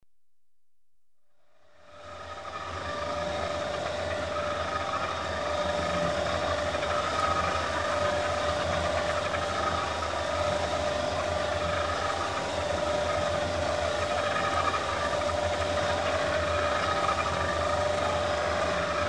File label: Lifeboat, Radar Scanner revolving; The BBC Sound Effects Library